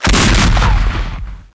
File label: A large shot by a cannon.; Age of War